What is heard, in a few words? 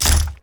undine move; Akeron